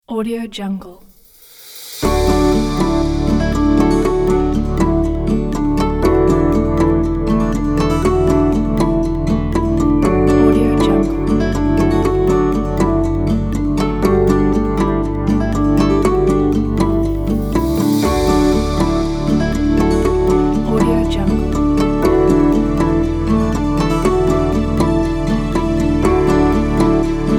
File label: acoustic ambient epic cinematic